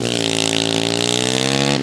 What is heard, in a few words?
power, Engine